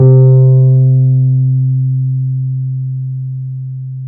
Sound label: PNO, Wurly